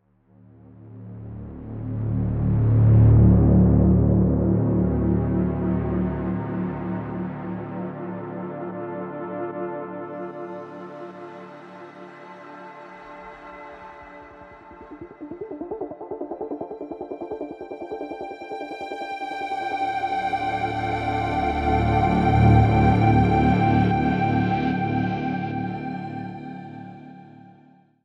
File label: Cryptic